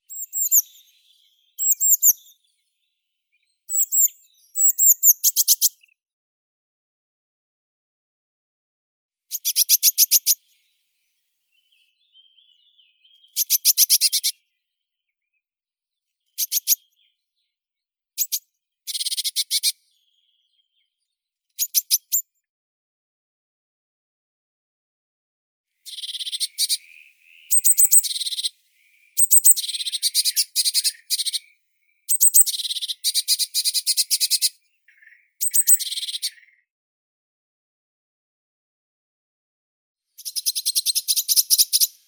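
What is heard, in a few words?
blue tit, Cyanistes caeruleus; lazorevka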